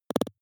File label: noise clicks splutter low; soundpool